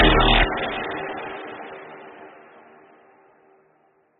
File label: wet synth stab 128bpm d minor